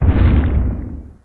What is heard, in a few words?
soil mud